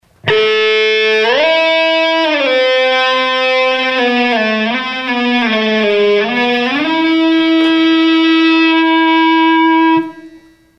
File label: Sustain (tilt), ebow